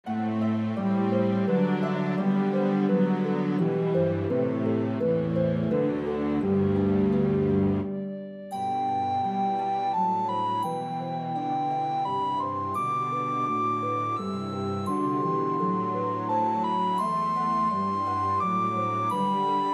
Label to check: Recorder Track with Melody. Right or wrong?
Right